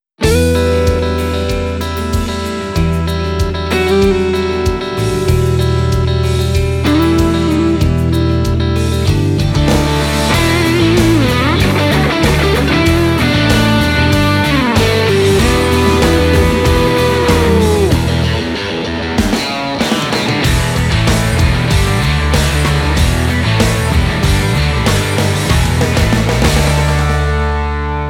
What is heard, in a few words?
cool blueser